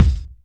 LOOSE LUG, KICKS; Back In Time Records - Big Bang

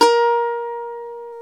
SAZ, Plucks; Eth Plucks, Pluck Duo

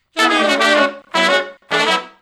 Funk Riff (D) har, Horncraft for R&B; Sections; Crimson Blue and Fabulous Horncraft 4 RnB

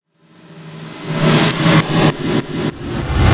DRUM FILL

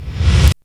DR-660 Perc, Drums, Boss DR-660

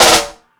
FX Sounds, Drum Machines

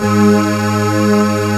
LINEAR SYNTH

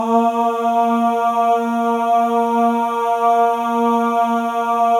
MALE AHS, Voices; Spectrasonics

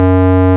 ORG, Farfisa Clarinet

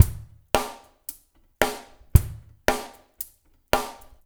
LQT DRM, Drums; Spectrasonics; Liquid Grooves 1 & 2

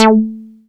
MINI Q PLK A3, MINIMOOG; Big Fish Audio - First Call Vintage Keyboards

